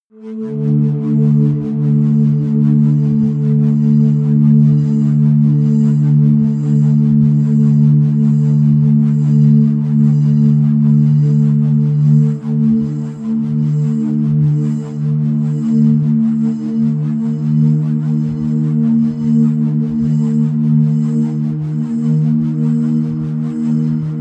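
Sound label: erratic, pads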